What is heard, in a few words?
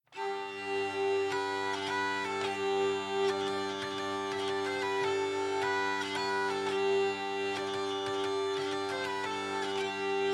Tavern; assassin s creed unity gamerip 2014